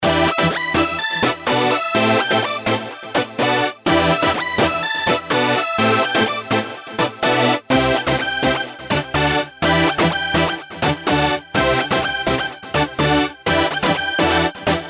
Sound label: Trance Hook; Minimax; dinopark